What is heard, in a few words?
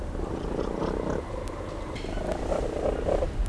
Balearica regulorum rokot